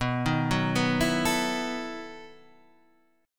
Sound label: E Major 7th Suspended 2nd Suspended 4th